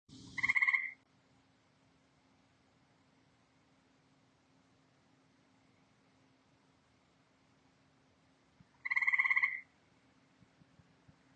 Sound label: Grey Tree Frog, grey tree frog in the ornamental gardens; experimental farm ottawa canada